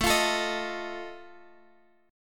F 7th A

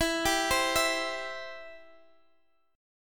E-Minor Sharp 5th, Guitar, Open G; x,x,14,12,13,14

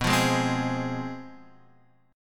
F Minor B; Fm/B strummed; JGuitar

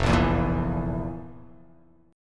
F Major 7th Suspended 4th F; FM7sus4 strummed; JGuitar

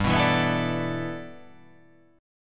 G 6th Add 9th

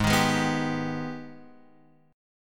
Ab Minor Major 7th, down, Guitar, Open F; AbmM7; strummed

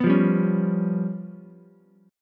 C sharp 7th Flat 5th C sharp down Ukulele; C#7b5; strummed; JGuitar

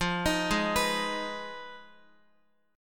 F Minor 7th Flat 5th, Guitar; Fm7b5; arpeggiated

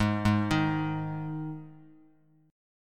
Ab 5th Ab Bass Standard; arpeggiated; JGuitar

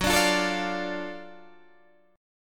Gb Minor Major 7th Sharp 5th, Guitar, Open D; 16,17,15,15,17,15; down; chord shape

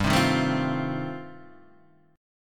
F 7th G, F7/G chord; F7/G strummed

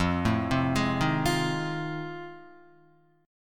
F 7th Sharp 9th, Guitar; F7#9 arpeggiated

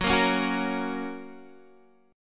F sharp Major, Guitar Standard; strummed; x,9,8,6,7,6; JGuitar

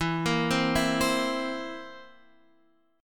Bb Suspended 2nd Flat 5th

